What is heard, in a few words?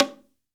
BONGO, STEREO BONGO, Cuba; AKAI S6000 CD-ROM - Volume 5